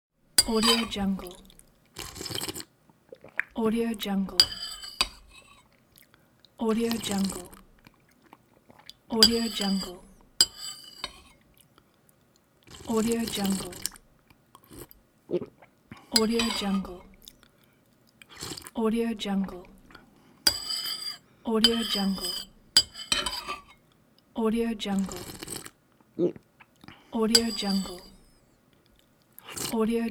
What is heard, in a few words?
eating soup